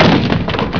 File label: DOOR BUST; MOVEMENT